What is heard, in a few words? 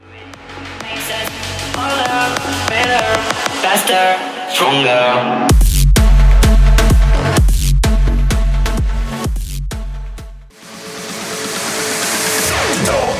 Festival Bootleg Mix 128 Clean